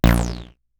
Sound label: bass stabs, Drum Machines FutureRetro FR777